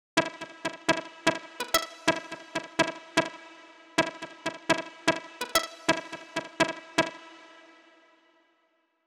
126BPM Seq Root E; Vengeance Samples; Tech Freak Kit

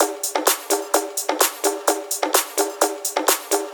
Latin House Loop 128 BPM; Vengeance Samples

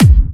Kick

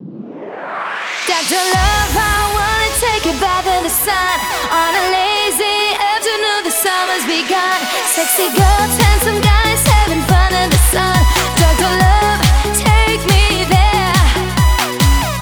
Mix, 140 BPM; Vengeance Samples; Vocal-Hook Kits; Song Kit

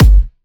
Kick, 130BPM; Take Top Kick

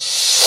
Sweeps Short

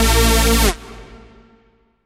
Synths A#; Vengeance Samples

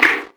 Percussion; Vengeance Samples; VEH2

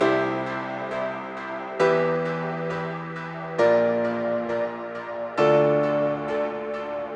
134BPM Piano Main, Cmajor; Melody & Synth

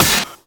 Snare; Vengeance Samples

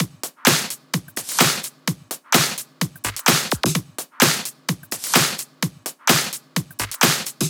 128BPM Drums; Vengeance Samples; Body Kit

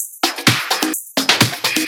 Percussive Loops 128BPM; Vengeance Samples

